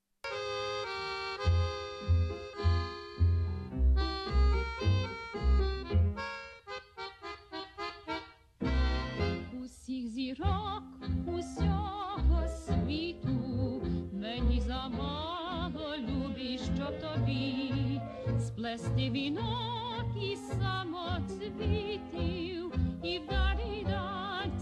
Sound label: Ukrainian tango